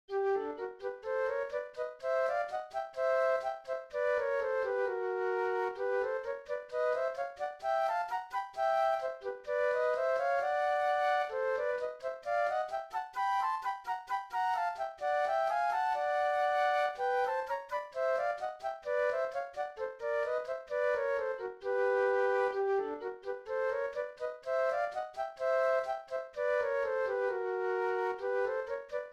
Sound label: chromatic study for 2 flutes